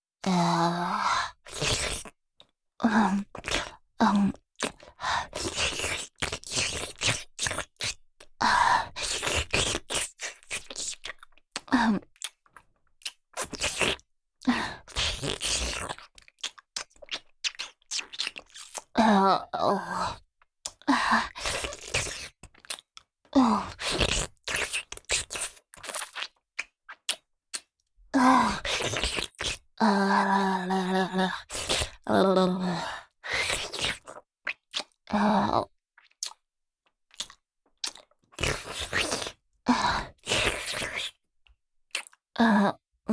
チュパ音 舐める・吸う; 陰茎から滴る精液を舐める・吸う; チュパ音抜粋集; ち○ぽ休憩と称して席を外しがちのOL

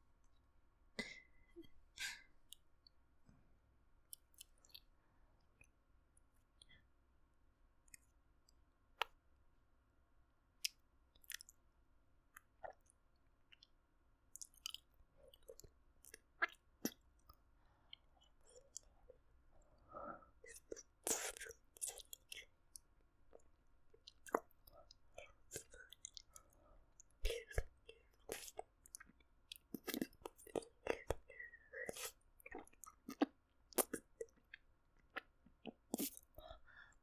キス; マゾハーレム

